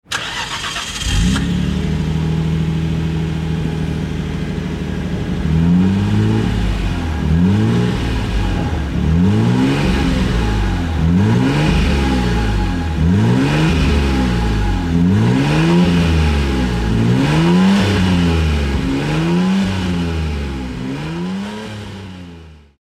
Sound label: Skyline GTR R34 RB26DETT